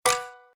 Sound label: btn Standard sfx; Zodiac Millionaire